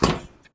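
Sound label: Grunt Movement Footstep Walk Metal Grate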